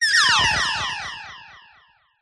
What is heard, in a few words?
Blasts Synth Delayed Oneshot; sound spark